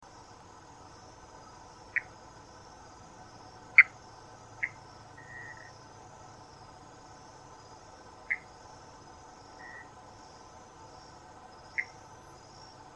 Pithecopus hypochondrialis

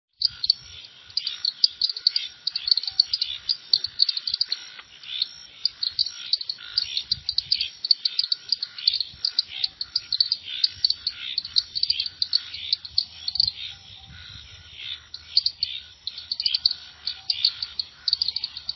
Dendropsophus walfordii

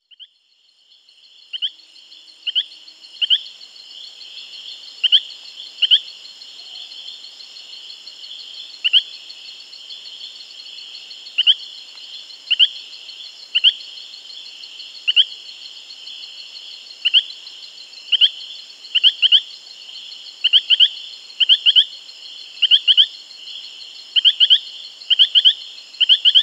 Epipedobates femoralis

